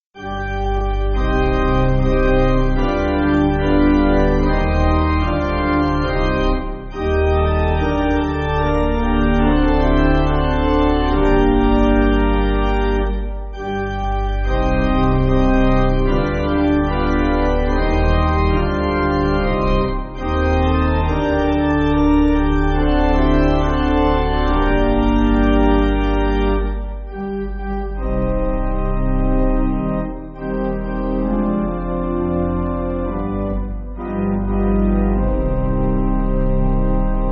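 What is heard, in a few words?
Spiritual Pipe, Pipe Organ, Music Backing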